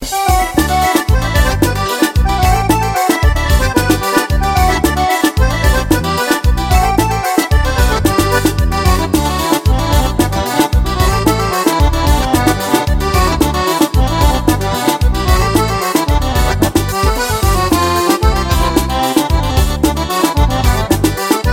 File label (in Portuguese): forro solado